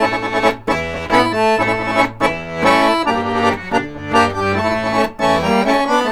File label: ACC, Balkanish Traditions